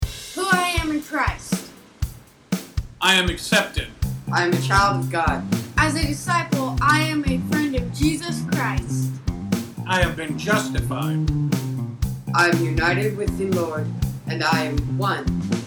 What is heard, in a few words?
drums